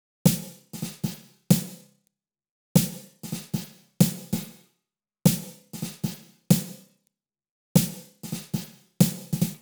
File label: Drumbeat - snare; Mixter Plus Drumbeat